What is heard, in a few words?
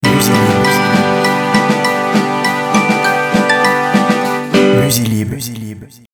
jingle bells jingle